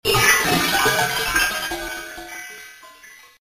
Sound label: star chimes; ringtones; sms